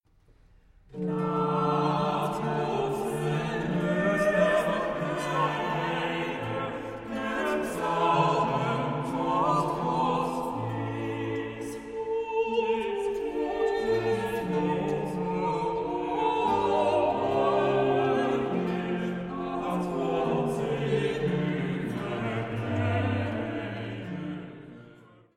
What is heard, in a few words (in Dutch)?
Koraal; Psalm 92 2; “’t Voegt ons met blijde klanken”